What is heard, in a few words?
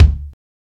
punchy kick single shot d key